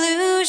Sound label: vocal